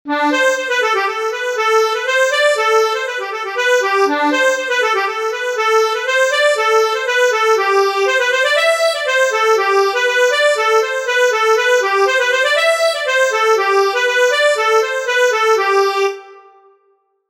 polka; Valentinou

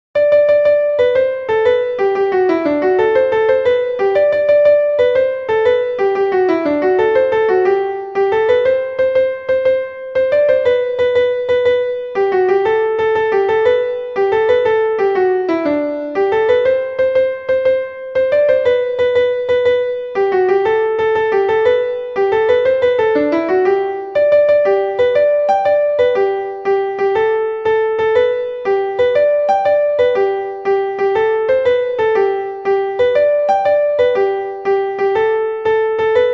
basque farandole; carnaval

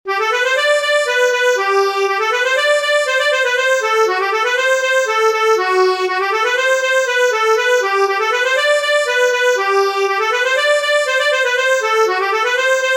polka; nice

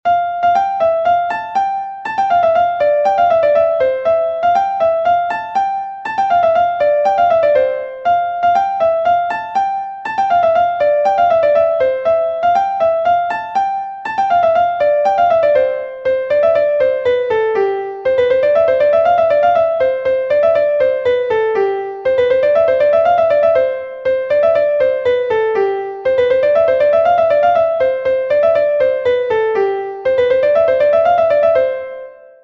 polka; quand lo merle

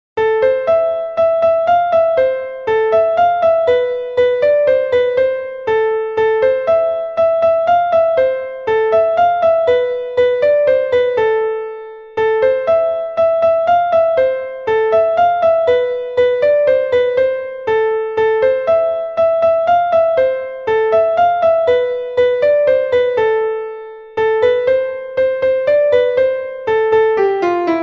Mazurka

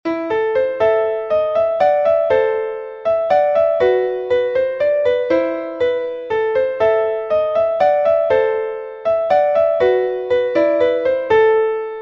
Mazurka

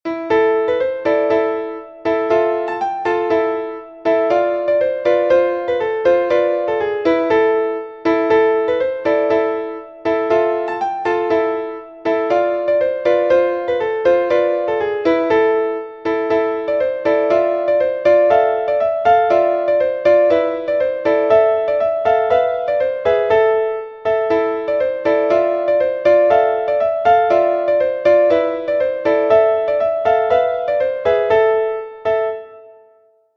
bourree; 2t aurore sand

